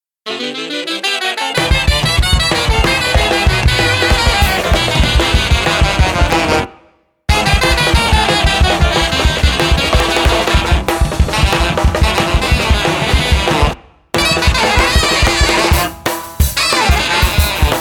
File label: SAX Improvisation